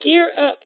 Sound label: gear up